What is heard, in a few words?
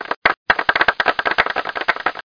Clap; Shells! 1.0.2